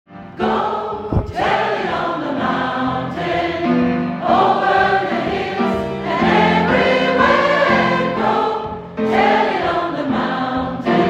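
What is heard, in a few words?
Go tell it on the mountain, Soprano & bass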